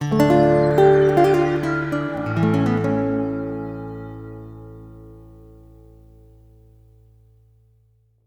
Lyrical lute; underworld ascendant

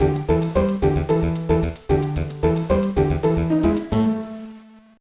Jingle; Nihon Pro Mahjong Renmei Kounin Tetsuman Advance Menkyo Kaiden Series; GBA

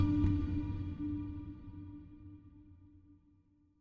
Chime; chime sharp

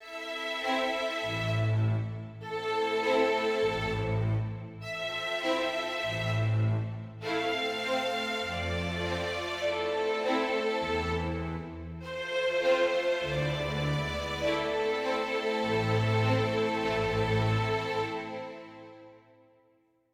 adventure forte

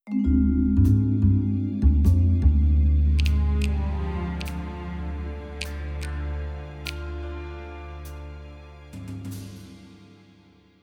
Sting

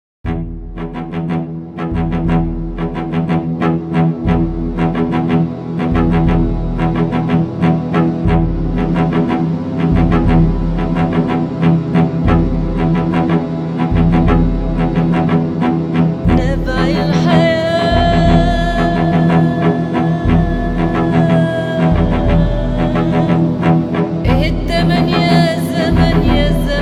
Intro Main 120bpm; project zomboid

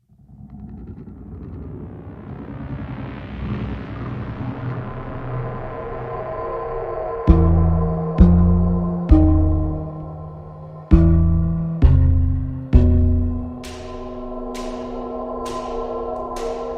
Boss Battle Ambient

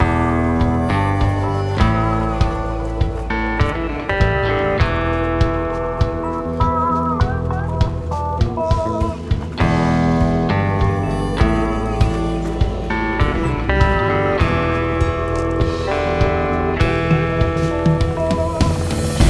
Music Main Menu with Ambient; DSC RedWings Music MainMenu with Ambient; Red Wings American Aces

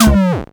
Character Hit

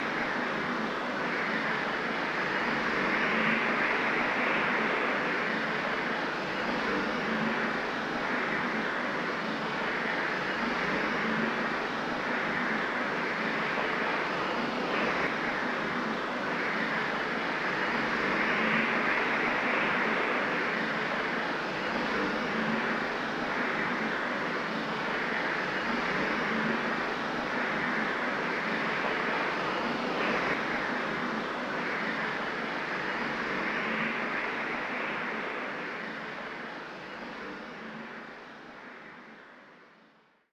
Forest Snowing; Remington Great American Bird Hunt; wii

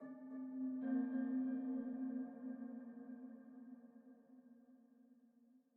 Env Field Night Cave; the legend of zelda tears of the kingdom

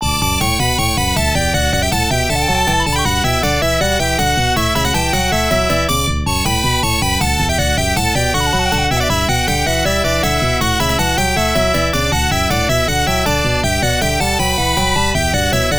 Main Bgm; Sadari

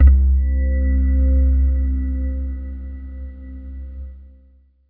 Event Feed

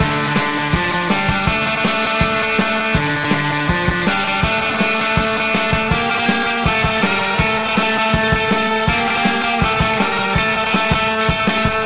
Music Beach

